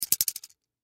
Party Favor, Party Favor Hand Clappers Shake Plastic Short; Foley; Blastwave